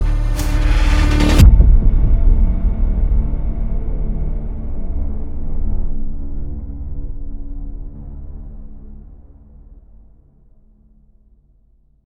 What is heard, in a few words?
Intro Woosh